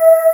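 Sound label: Synth Vox F#3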